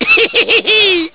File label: hehe